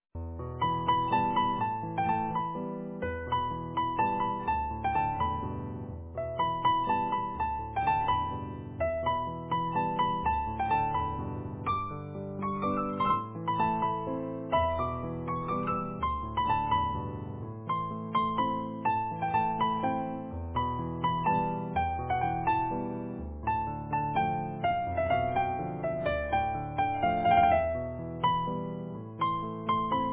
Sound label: easy piano